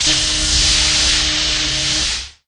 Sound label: Laser; Thunder Fighter